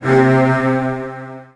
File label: C2, Virtuoso Strings, 16TH; ILIO; Kirk Hunter; CL 16TH